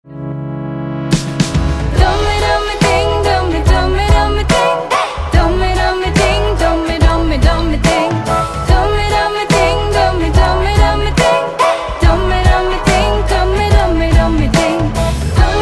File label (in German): Klingelton